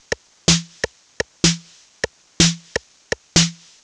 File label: drum loop under percussion 125, Classic Chicago House; Sample Magic; Drum Loops